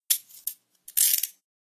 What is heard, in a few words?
handcuff